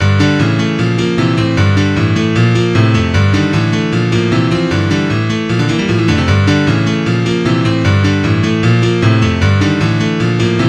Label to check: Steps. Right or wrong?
Wrong